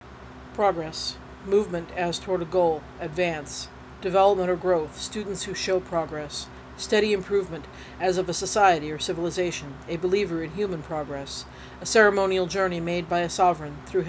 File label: progress